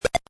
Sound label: kill enemy